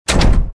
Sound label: door locked, doors; movers